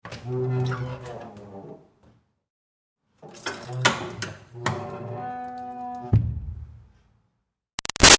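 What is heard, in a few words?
Door Wood Push Bathroom Groan Open Close Medium; Big Room Sound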